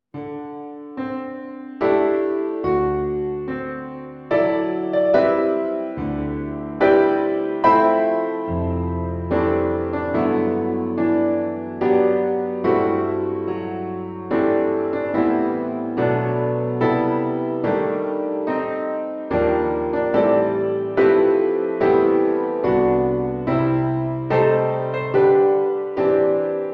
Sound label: Happy Birthday for Piano in G